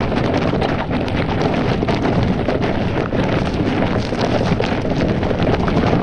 dirt tread, vehicles; aronwk collection; ndaudio

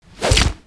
Shoot Complete; ambience; gnarled forest

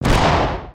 Shoot; ambience gnarled forest

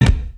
chest pound; creatures organic

